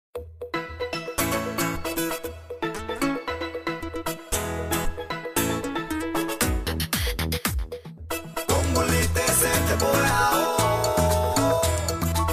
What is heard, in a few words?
Spanish Muzic